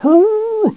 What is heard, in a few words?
cry